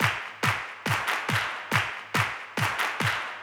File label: Mini Kits Drums 140BPM